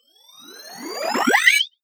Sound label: sweep